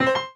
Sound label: PUZZLE Success Piano Three Note Fast Bright Dry; stereo; Universal Sound FX